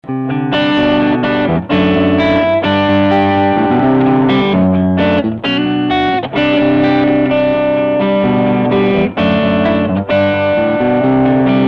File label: chunky rhythm